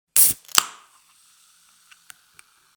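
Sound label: beer opening sound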